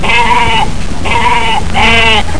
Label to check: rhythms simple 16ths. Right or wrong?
Wrong